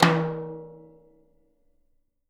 Living Drums, JZ XTOM 1

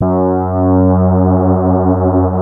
FAT LEAD, Powered DX; Wizoo

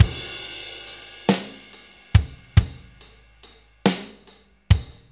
6/8 Loop, Drum Tools, Laid Back

